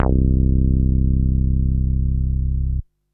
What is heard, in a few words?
Basses C; Vengeance Samples